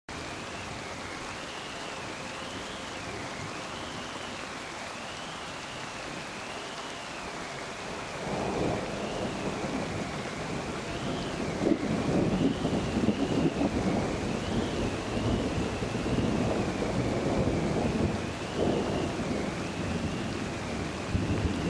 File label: rainstorm